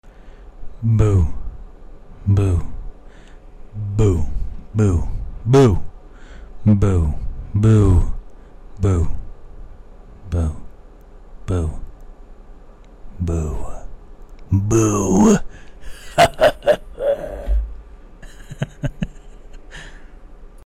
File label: boo